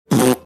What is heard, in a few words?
blowing a razz